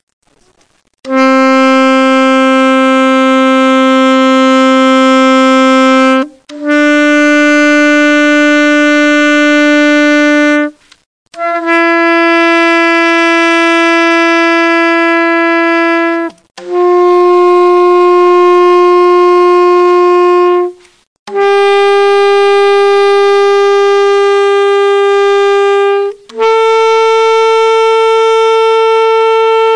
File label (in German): altsax, tonleiter; Altsaxophon a